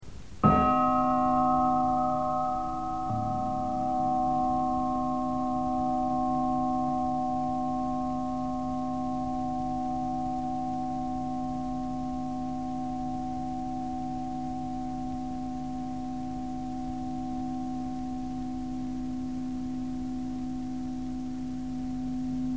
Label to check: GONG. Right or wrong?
Right